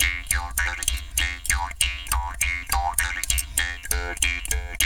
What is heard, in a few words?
JAW GROOVES, Didgeridoo